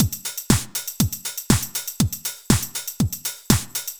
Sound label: House Drum Reverb